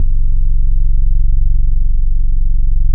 F#1, Boom da Bass; E-MU Producer Series Vol. 6 – Analogia Project (CD 1); Boom da Bass 3

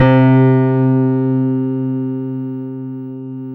PIA MICRS, MICRO-PIANO

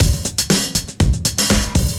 breaks, 120bpm; free bespoke breaks; BeatStyleC

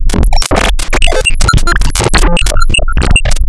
UHF Complex, wavetables; Architecture 32

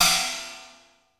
XE8 CYMB, drum; Crypton - DrumBase5000

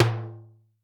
Timbale Low, Yamaha PTX8

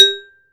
short vibra, A G-3; AKAI S-Series CD-ROM Sound Library VOL-7

